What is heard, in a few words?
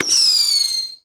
rocket launch; ambient; newyear; dota